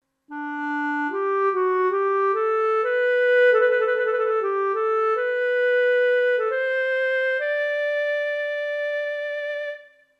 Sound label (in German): Recorder App Klarinette; Artinoise